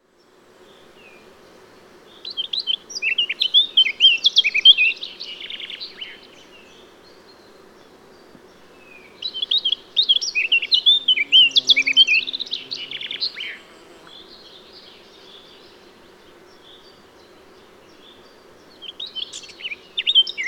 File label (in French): Réveil des oiseaux Pinson Montagne